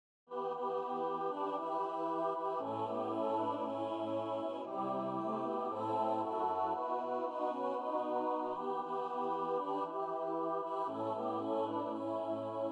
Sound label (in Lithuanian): VISI GARBE Šv Augustino mišios; angelu sargu giesmes